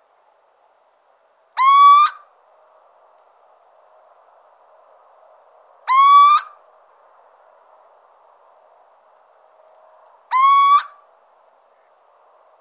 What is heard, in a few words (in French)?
Chouette cheveche